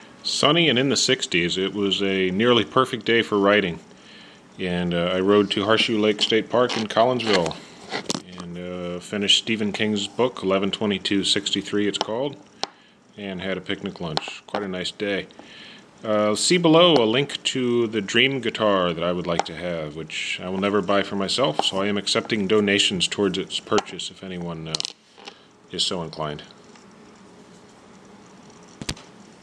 bikes and guitars

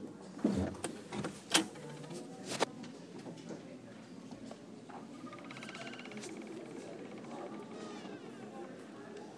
door

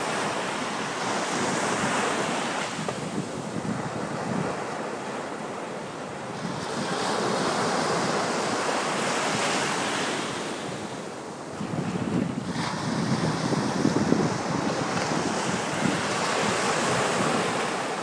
sounds of coney island in march

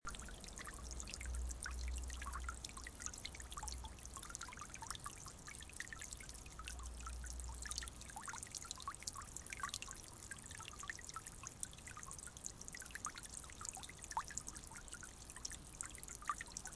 garden pond

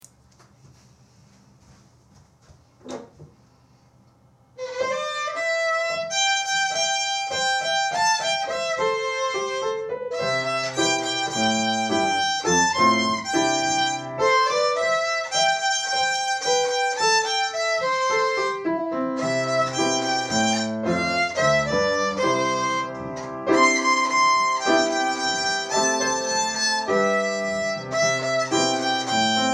Little Lamb violin

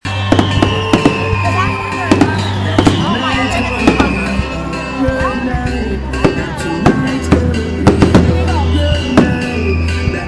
fireworks; outlaws

